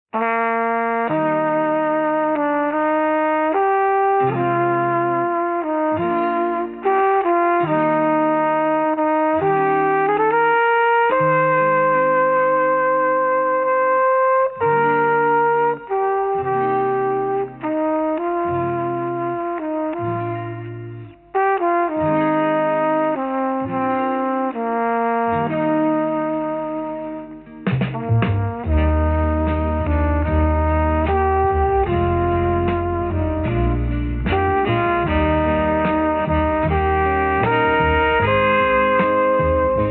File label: Irish Love Song